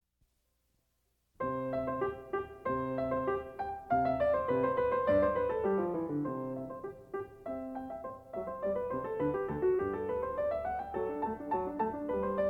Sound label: Keyboard Sonatina in C Major, Op. 36 No. 1 - I. Allegro